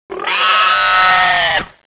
horngun idle, weapons

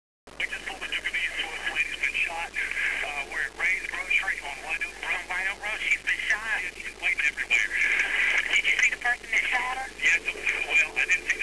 shot

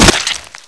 spider bite; tarantula; svencoop

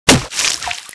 slosh; svencoop

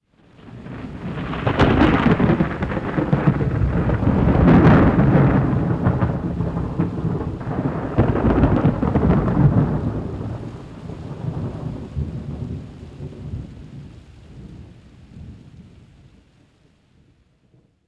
thunder, ambience; dod

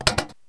m249 cover up

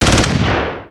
turret

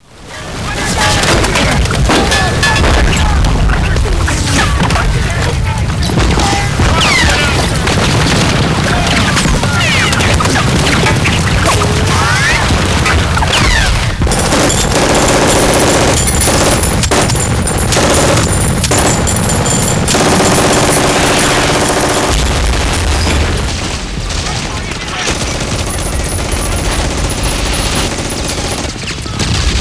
beach fight, ambience; dod

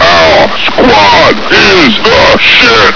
cheer; svencoop; voice commands; Grunt

